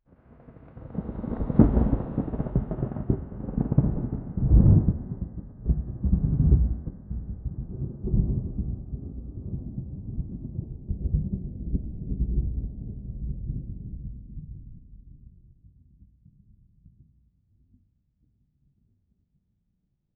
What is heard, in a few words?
faraway thunder